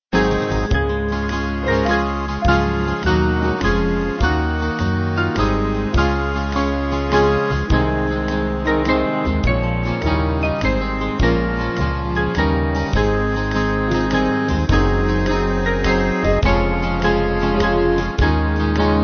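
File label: Band, Music Backing Files for Churches; We Gather Together; Kremser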